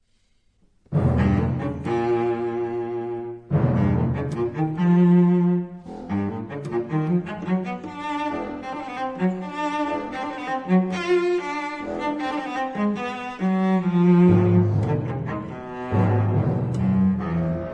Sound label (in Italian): concerto violoncello